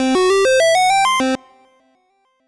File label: Retro Victory; Retro Victory, Defeat and Briefing (Mixed Systems)